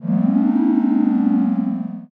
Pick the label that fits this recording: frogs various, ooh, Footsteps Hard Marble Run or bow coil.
ooh